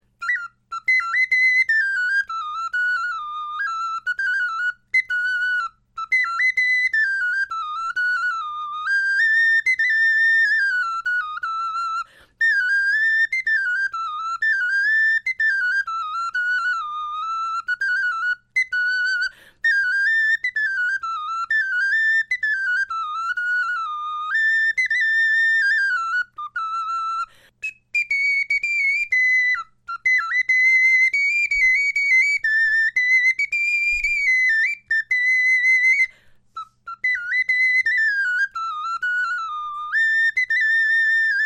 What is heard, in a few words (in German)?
melodie